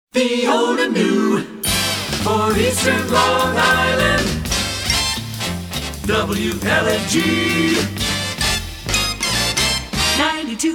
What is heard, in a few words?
Jingle; Short Attention Jingle Show